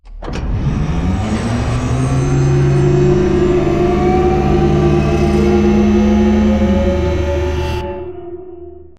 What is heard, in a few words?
Giant Floor Panel Arrive Servo; Boomtown; Sideways Town; Titanfall 2